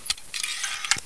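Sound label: crossbow slide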